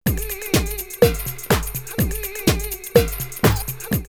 4 On D Floor 124, drumloops, Rave; X-Static Goldmine; 90 s Sample CDs

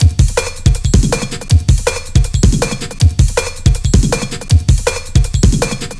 160, Drum Loops; Total Drum&Bass

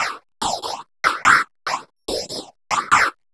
TAMB; Zero-G; Chemical Beats

